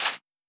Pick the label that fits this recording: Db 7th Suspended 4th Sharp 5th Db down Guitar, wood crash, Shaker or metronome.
Shaker